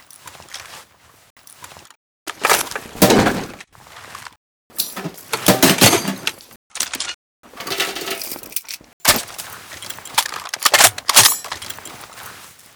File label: reload empty; tacz; RFP v1.0.0 beta2